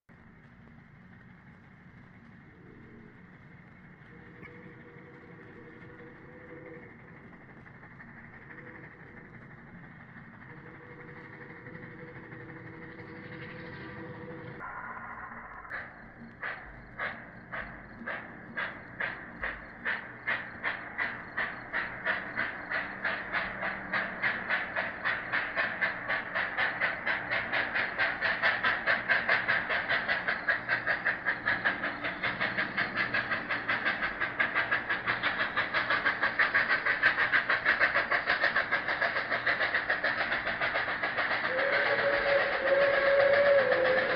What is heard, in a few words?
K-4, Steam Sounds; New Hyde Park; Steel Rails